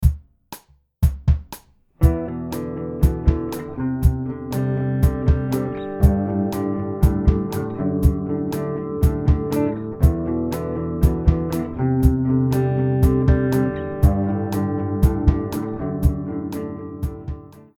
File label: guitar sample